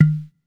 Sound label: error